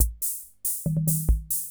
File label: 140 POPS